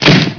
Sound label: crash wood